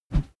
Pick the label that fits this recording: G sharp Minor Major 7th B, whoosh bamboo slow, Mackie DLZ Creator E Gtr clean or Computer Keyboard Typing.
whoosh bamboo slow